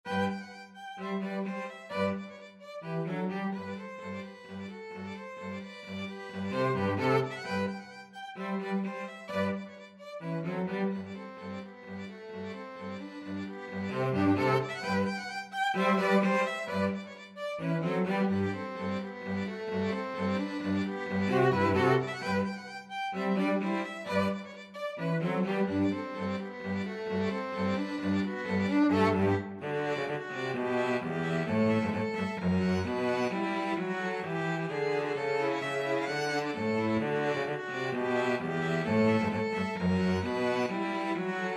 nutcracker trepak 2 violins cello

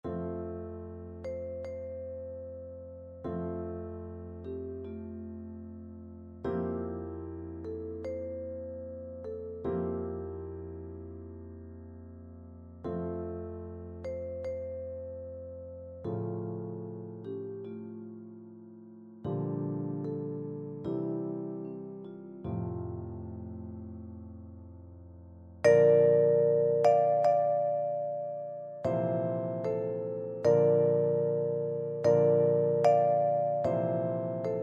VIB; dvorak

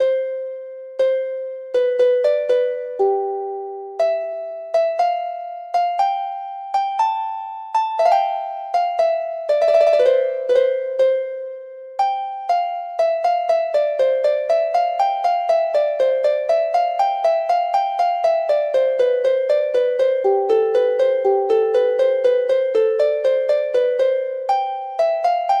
harp

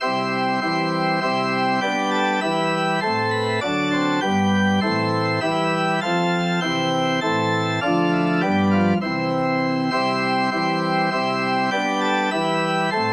organ

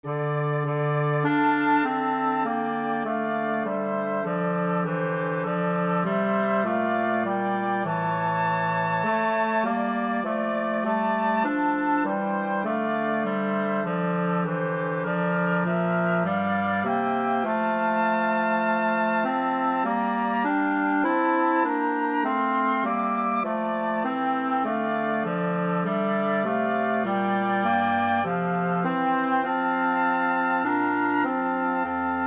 god rest, wind quartet

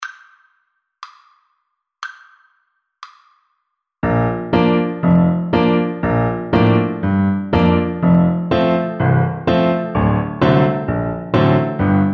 Flute; turquoise bracelets

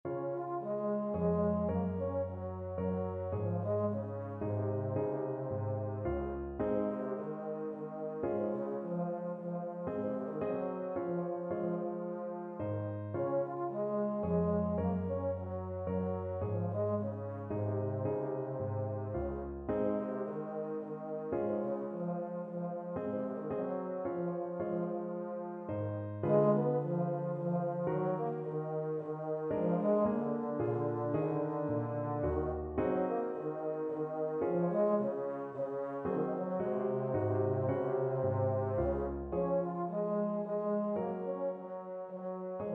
euphonium

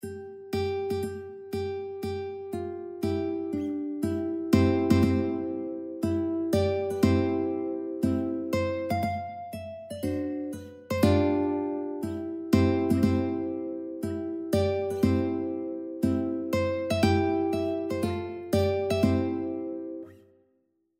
wedding march UKE, ukulele

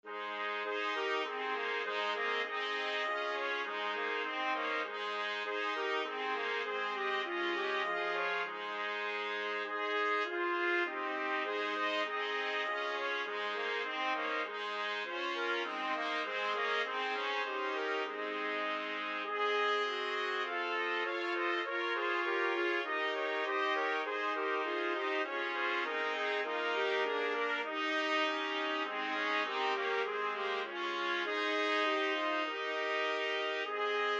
4TPT, trumpet quartet

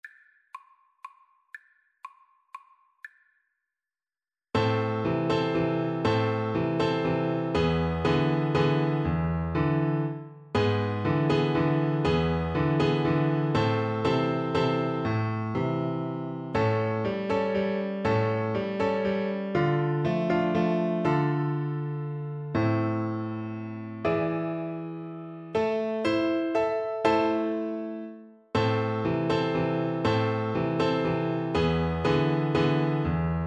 bass guitar

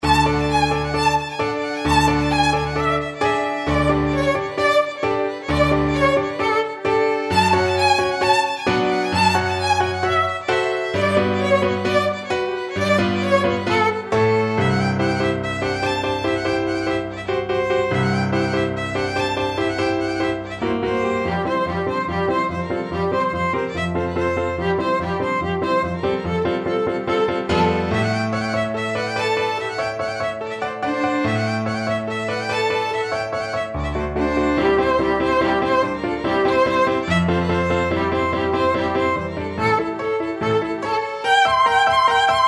romanian folkdance, violin; bartok

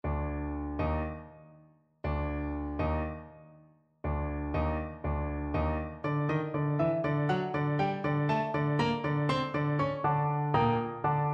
recorder

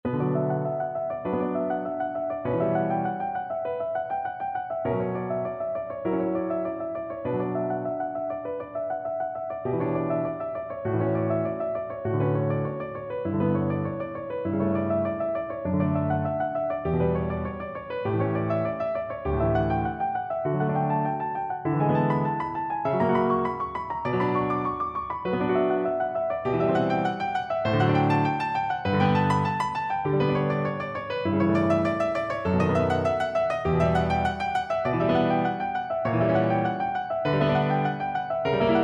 prelude in b minor piano

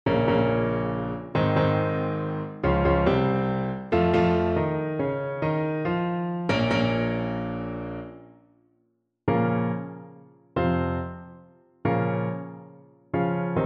Trumpet

